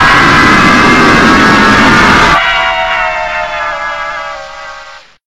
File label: jumpscare; old freddys a place of fun